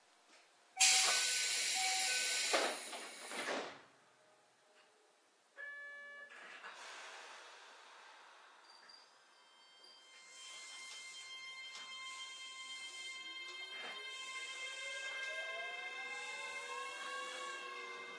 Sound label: keio 7000, railway; kanto